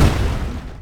projectile impact; bane

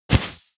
arrow split; weapons; dota; medusa

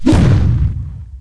freeze explosion; weapons; dota; crystal maiden

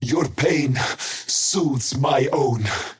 skywrath crown attack pain; skywrath mage

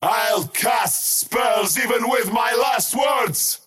skywrath crown cast pain; skywrath mage

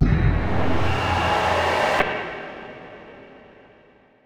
parry sai cast; weapons; dota; kez

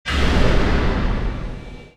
shield target; weapons; dota; abaddon

